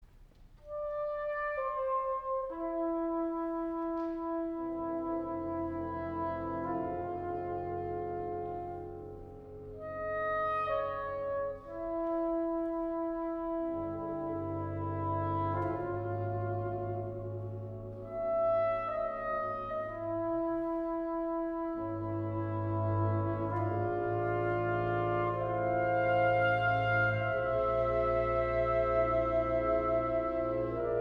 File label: Concerto For Piccolo Trumpet And Wind Ensemble Adagio Semplice